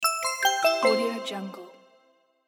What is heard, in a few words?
message sound